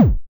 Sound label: boss attack